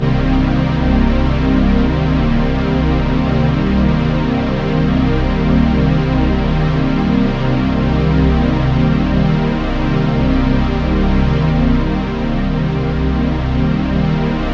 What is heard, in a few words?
C3, Synth Line